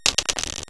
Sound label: dice; Mah Jong Parlour